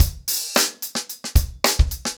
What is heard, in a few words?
110BPM, acoustic drums; The Roots Drumloops